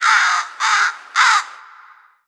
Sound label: bird bird bird; Portal 2; threesies